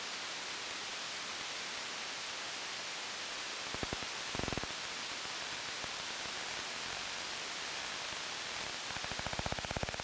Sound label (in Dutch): buzz en clicks